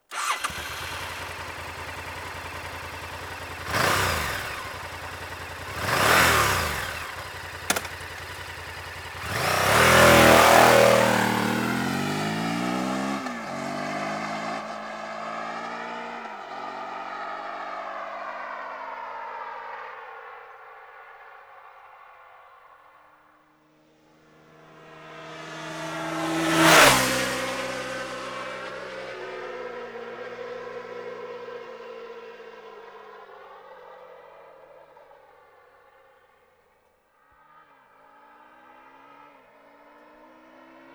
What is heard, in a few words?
Honda XL 1000 V Varadero